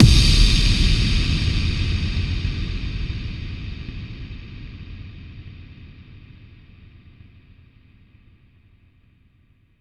FX Kicks

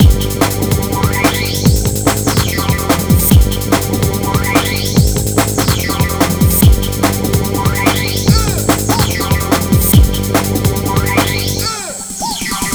Hardcore Breakbeat, 145 Gmin; Big Fish Audio; Hardcore Breakbeat Action

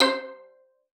C5, VIOLIN SPICC; Xsample VOL-5

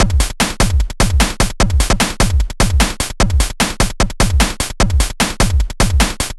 150 Bpm Chiptune Drum Loops

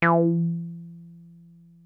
303 D#3, TB 303; Hollywood Edge; Giorgio Moroder Rare Synthesizer Collection

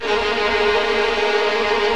VIO MAJ TRIL

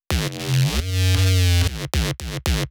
Neurobass F2

